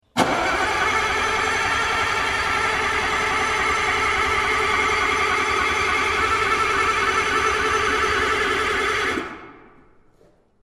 engine general motors hydraulic pump ignition fail